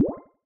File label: blubb, battle; CrossCode